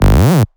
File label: Scratching FX